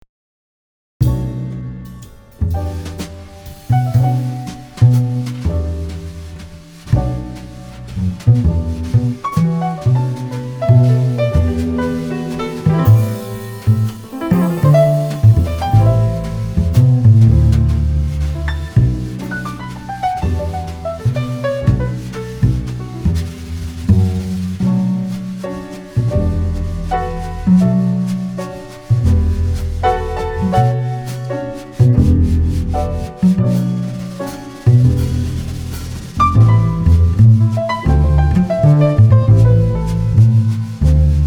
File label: Jazz Piano Trio Smooth Mellow 122